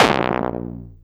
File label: combidrums, techno; single-drums